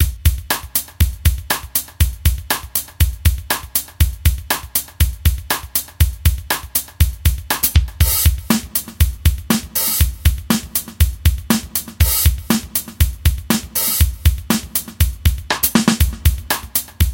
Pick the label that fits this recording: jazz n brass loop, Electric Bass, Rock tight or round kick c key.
Rock tight